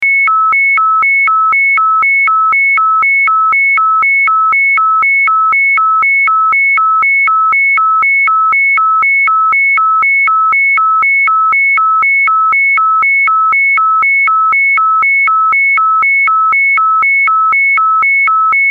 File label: 2182 auto alarm, AUTHENTIC NAVY ALARM SOUNDS